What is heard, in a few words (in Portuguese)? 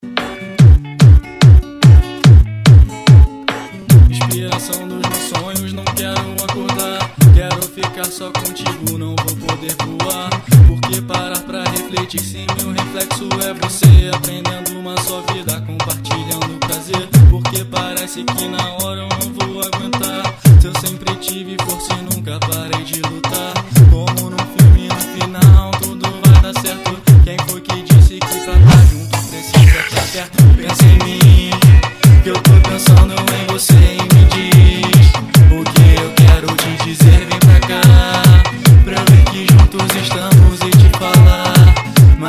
dance mix e nacional sernanejo pancadao e funk mix; sinop mt